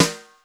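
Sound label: Snare Brush Hit, Alesis D4 drum machine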